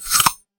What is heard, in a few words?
bite